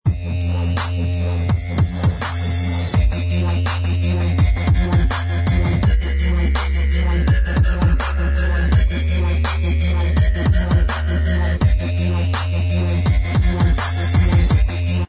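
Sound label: dubstep remix instrumental Track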